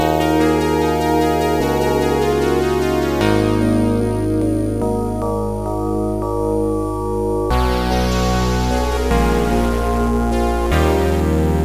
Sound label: microtonal ish